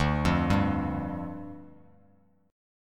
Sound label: D Minor Double Flat 5th, down, Bass Standard; Dmbb5; arpeggiated; JGuitar